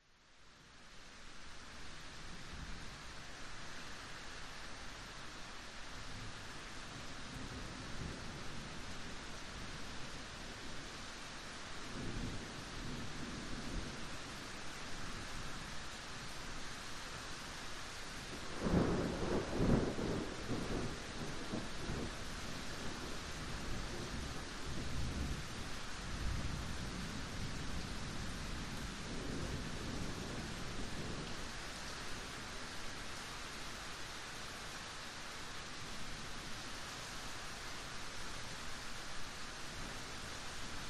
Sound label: nature thunder cracks very close by light rain yard